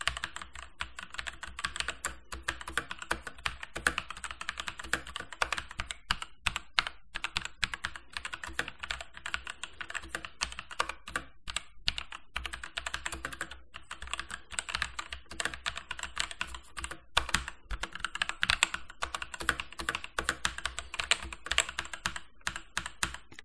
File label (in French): enregistrement son clavier; corsair k70 core